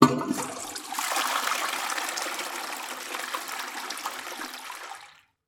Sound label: household toilet flush automatic; sound effects one